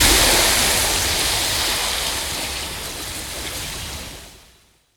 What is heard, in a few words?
Nature Tail